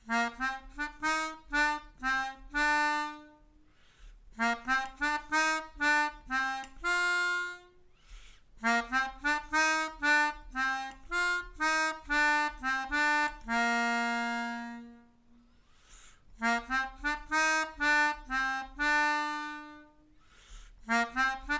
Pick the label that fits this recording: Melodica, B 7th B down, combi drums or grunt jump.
Melodica